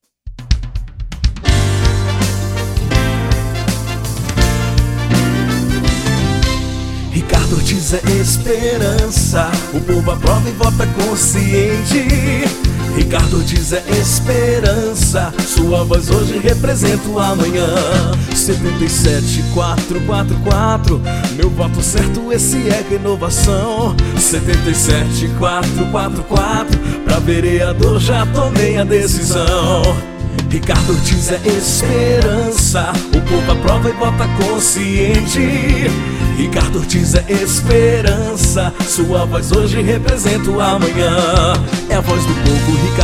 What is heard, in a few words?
Jingle